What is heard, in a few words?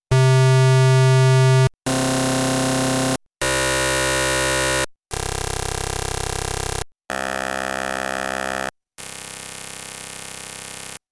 Four Cycle Synth